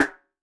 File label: JNGL SN, Snare; Yamaha DTXpress-II